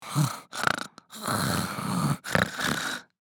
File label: Young female heavy snore